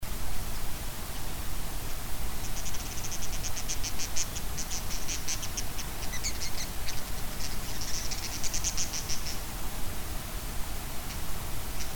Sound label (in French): martinet a collier roux